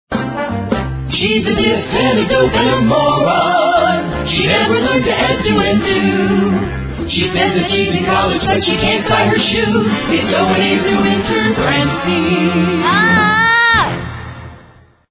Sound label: jingle